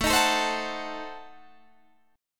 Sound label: A Diminished 7th A, Guitar Standard; 17,18,19,17,19,17; strummed; JGuitar